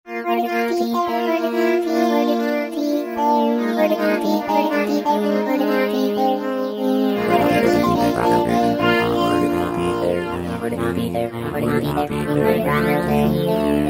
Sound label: Space Babble, Roland V-Synth; Factory Patches